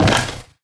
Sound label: fs fm plywood, footsteps; Portal 2